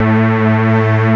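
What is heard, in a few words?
ensemble, ANALOG; CMI Sound Library Rev 1.3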